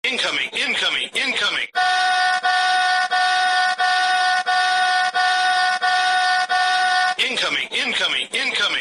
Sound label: incoming siren